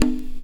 DR. LOOP; X-Static Goldmine 3

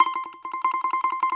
BAGLAMA T1CR; E-MU Producer Series Vol. 7 – Old World Instruments

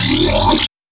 squirt, bass

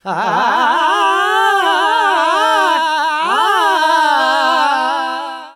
Vocals Male World Pop; Sonic Foundry (Sony Creative Software)

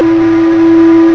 ZAMPONA, WIND

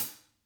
hat, Vintage Timetraveler kits; Sonic Reality - Vintage Timetraveler

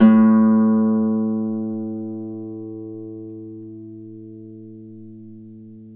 Celtic Harp, Plucked Strings

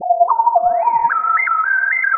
Quasar Whistle 110BPM; sci-fi; Loops

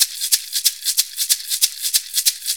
Shuffle Perc, Perc Loops; Sonic Foundry; Sony Creative Software; Loops & Samples Drum Tools; Shuffles

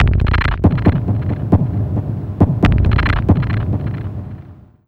CREEPY; Big Fish Audio; Uncivilised G'rooves

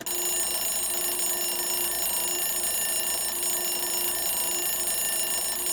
alarm; AKAI S-Series CD-ROM Sound Library VOL-6

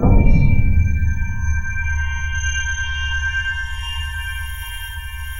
ICICLES R; NorthStar - The Wizard VOL-2; DRY ICE

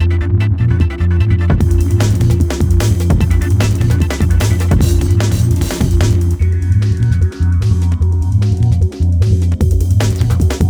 Hardcore Breakbeat, 150 Fmin; Big Fish Audio; Hardcore Breakbeat Action; Trukin

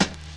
vinyl hat

